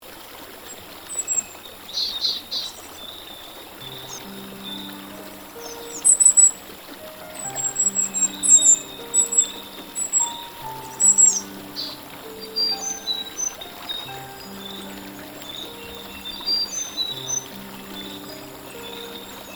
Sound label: forest; ghibli